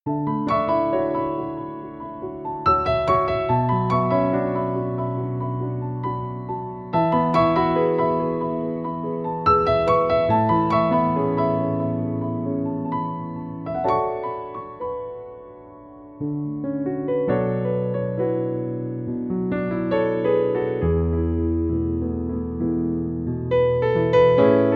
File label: Piano Version